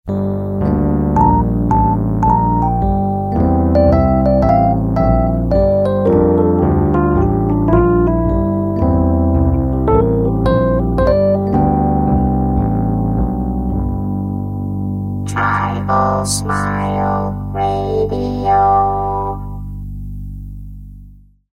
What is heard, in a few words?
Jingle Rhodes Blues